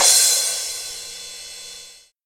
cymbal sound g key, Royality free cymbal drum sample tuned to the G note. Loudest frequency: 6620Hz